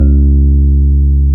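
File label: Piano Rhodes; Electrics; Club-50 - Foundations Roland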